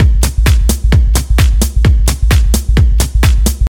tech kick sample wooble bass; drum loops

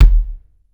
low steel kick drum sound e key

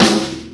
rock acoustic snare a key; snare drum samples

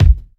original bass drum sample g key; kick drum samples

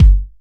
clean house steel kick drum sample g key

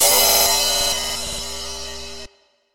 long tail reverb crash sound c key

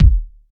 kick drum sample A key; kick drum samples

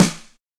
solid acoustic snare sample f key; Mono; snare-drum-samples

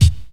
good kick single hit f key

